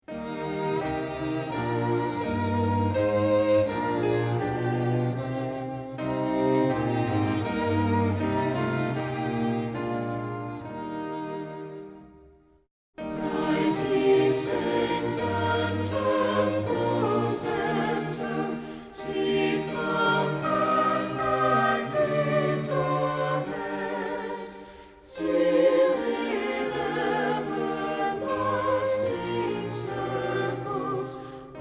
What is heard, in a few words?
rise ye saints and temples enter words and music